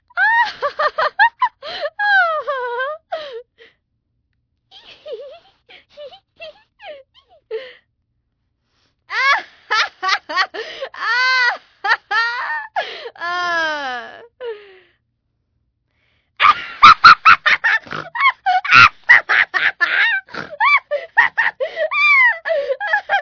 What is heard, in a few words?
Laughing Girl